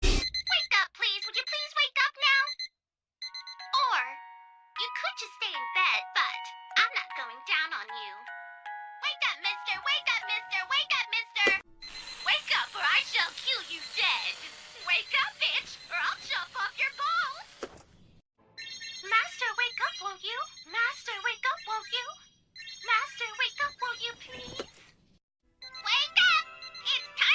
Alam Clock

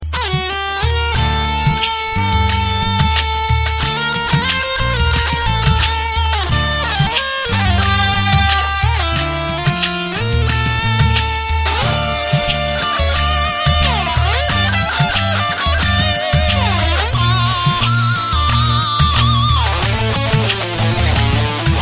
Rock

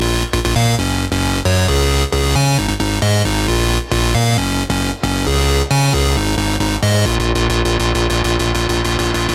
Games Song

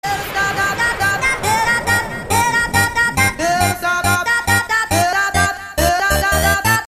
Ta Ta Ta Sms

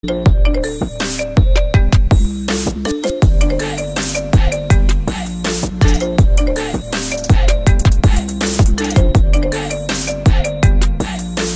iphone 11

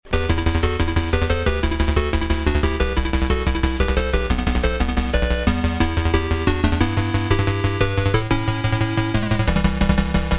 Game Red Alert

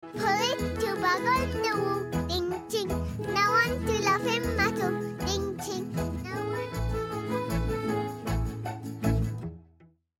Bingo Ding Ching

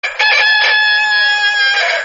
Rooster Sound

